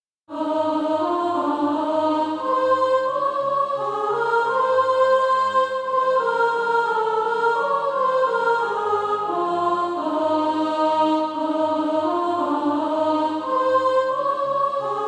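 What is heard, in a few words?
soprano, SATB Practise Music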